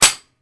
Metal Shell Ejects; Small Hollow Metal Impacts. - Kitchen